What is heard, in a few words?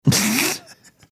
snickering